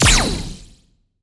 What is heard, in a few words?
Standard Laser Fire